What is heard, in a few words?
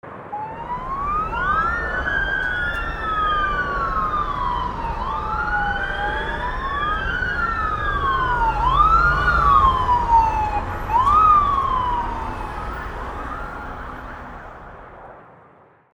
Ambulances rushing to accident scene siren on city traffic